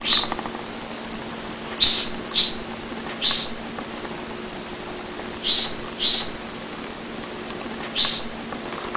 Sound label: hissing; short and fast hissing